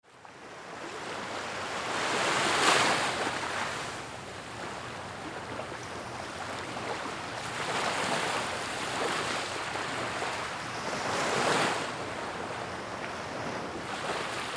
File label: beach at night in miami